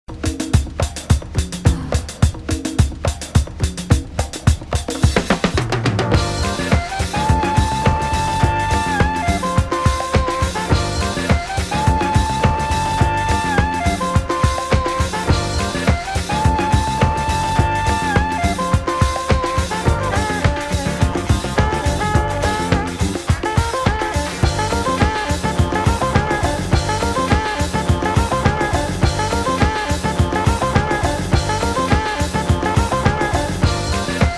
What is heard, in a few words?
techno